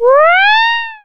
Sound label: A#, FX; Big Fish Audio